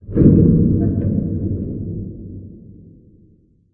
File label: amb muffled lo mach; video games; ambient